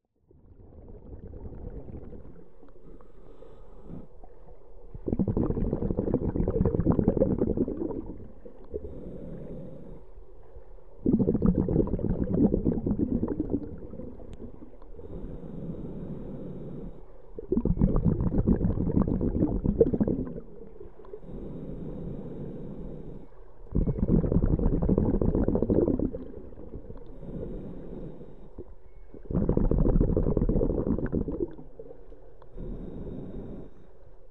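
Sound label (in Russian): diving, scuba diver breathing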